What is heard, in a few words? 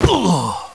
fall hit, male